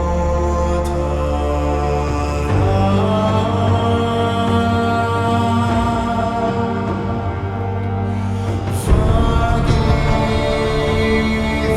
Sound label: Lab Reveal Cue; Entrati; TennoCon 2023